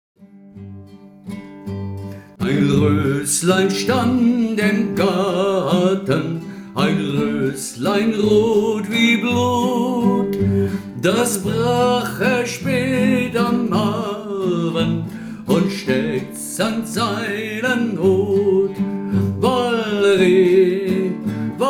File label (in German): ballade 6-8